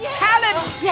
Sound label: sample, male laugh